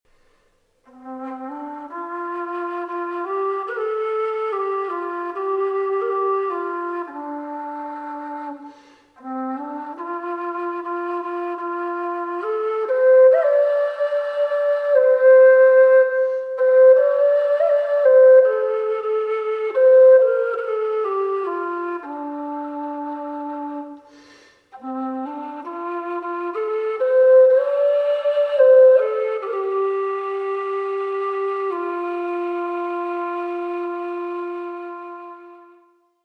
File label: low c whistle